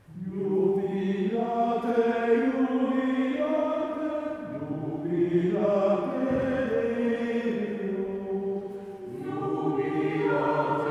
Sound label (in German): Zwischengesang, Chor; Jahresschlussgottesdienst 2024